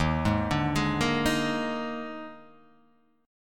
Eb Major 7th Suspended 4th Eb, Guitar, Half Step Down